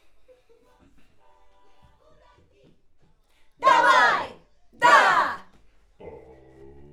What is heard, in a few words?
Schoeps AKG, piano